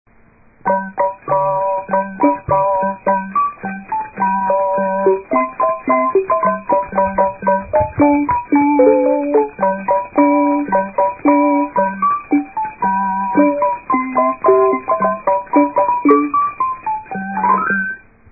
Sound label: Jingle Bells; At the sound of the beep